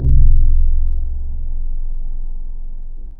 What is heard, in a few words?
Pizzicato; Synthetic's Serum Collection